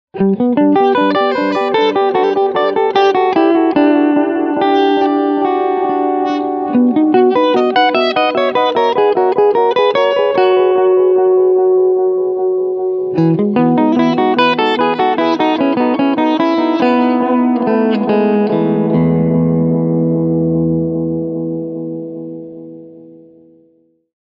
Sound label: Medium Delay No Mod